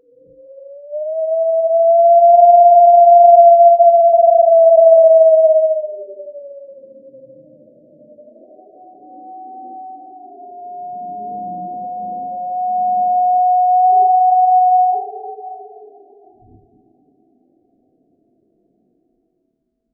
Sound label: various different wooshes; air